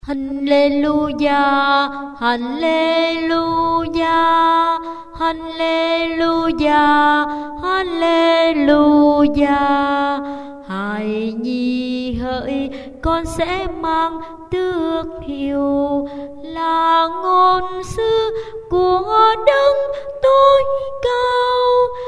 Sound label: tenor basso